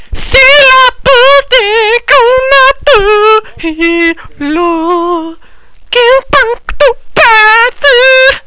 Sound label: boop